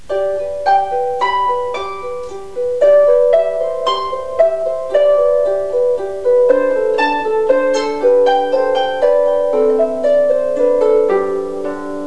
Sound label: harp